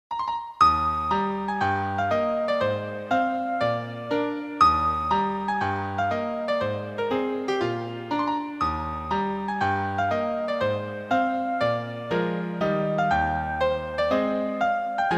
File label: Piano Sonata Rondo; Field